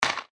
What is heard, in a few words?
hockey puck hit